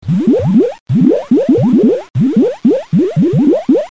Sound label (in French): Bulle d'eau; bruitage; Liquides